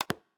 new particle lock; LG Aristo 2 PLUS X212TAL

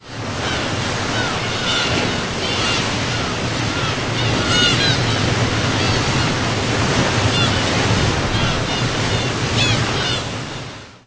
Wave; Innostream INNO 55

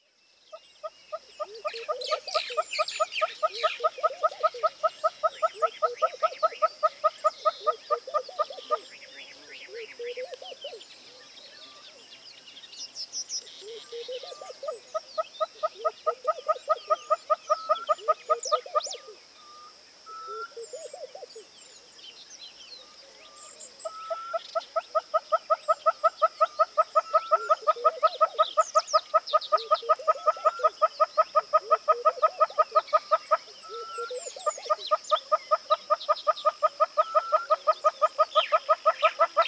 Botswana bushveld at dawn; Google; Google Sounds; Sound Matters; alarms

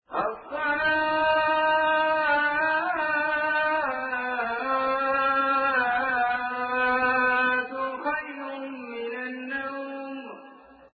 azan; Spreadtrum

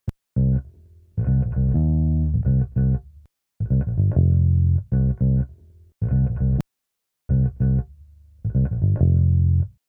Funky Bass; miniBAE source code audio

